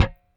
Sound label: Rickenbacker Bass; Airwindows; Rick-Xtras